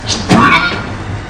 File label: spinner spin; cedru dt